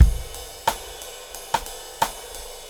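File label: 89 bpm, beat loops; Zero-G; Monster Beats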